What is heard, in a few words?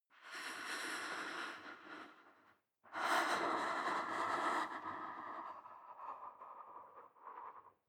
breath female inhale exhale